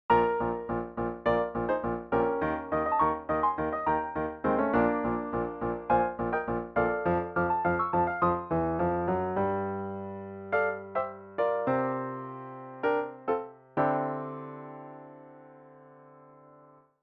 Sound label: Rehearsel Piano